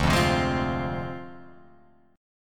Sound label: D Minor 7th Flat 5th, Guitar Open F; Dm7b5; strummed